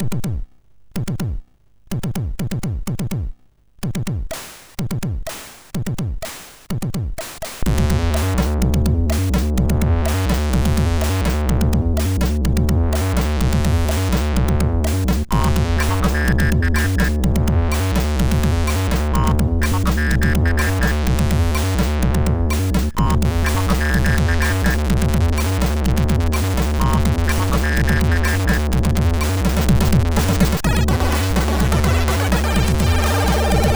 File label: sid MOS6581R2; Mini Mania tune 4